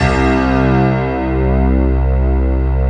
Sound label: piano pad C2